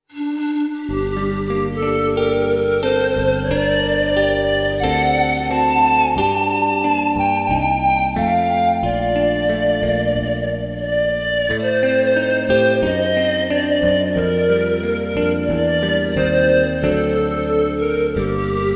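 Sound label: Värmeland traditional song, Pan flute music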